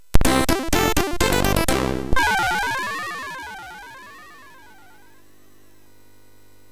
Score, MOS6581; MOS6581R3

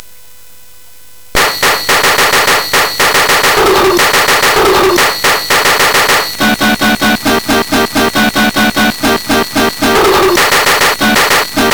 Computersound, CSG8580R5; hvsc

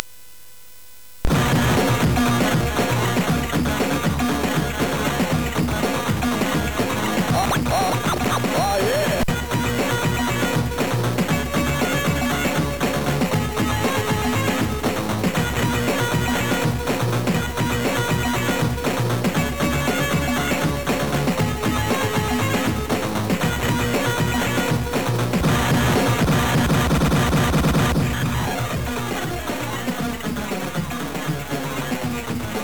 Ego Blast, sid CSG8580R5; hvsc

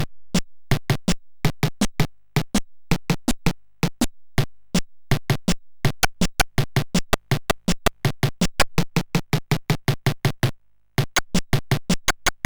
Digidrum Concert, MOS6581R2; hvsc

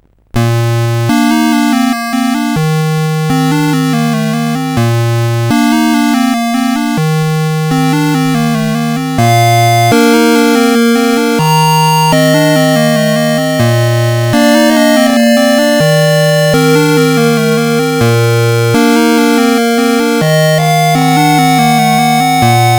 Musicbox, CSG8580R5

